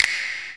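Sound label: finger click; CLARITY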